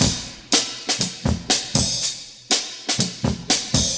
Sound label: Drum loops, Funky